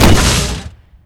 molotov launcher shoot, weapons; tf2classic

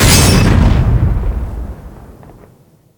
molotov detonate, weapons; tf2classic

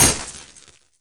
knife hit world; weapons; tf2classic

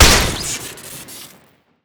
metal gloves hit crit; tf2classic

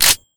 tommy bolt backward; tf2classic

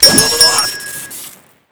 twig swing crit; tf2classic

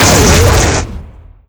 tau explosion charge; tf2classic

